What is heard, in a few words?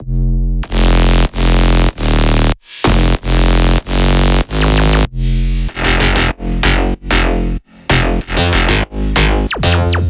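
Bass Loop F minor 95BPM; Dubstep; Black Lick; Ghosthack - Advent Calendar 2022 - Day 18 - Bass Loops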